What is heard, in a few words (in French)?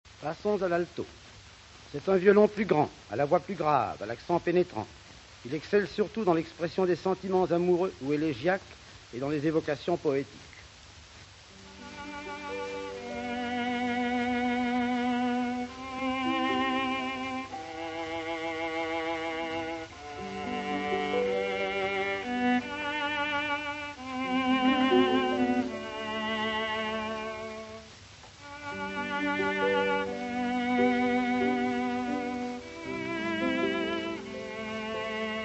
orch alto